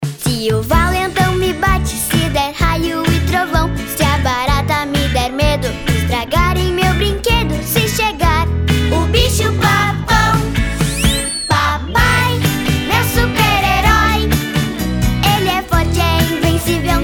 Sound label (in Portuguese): Jingle Dia dos Pais